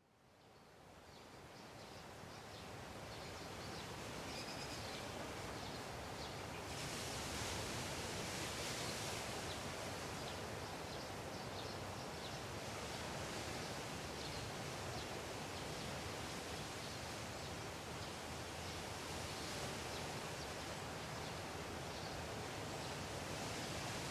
Surround ocean waves with birds Stereo Downmix, Recorded in a garden; Ocean Waves With Birds - Recorded in a garden park near the ocean. Calm ocean waves wash up on the shore